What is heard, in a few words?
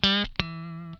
lick scale, funk guitar; Zero G - Funk Guitar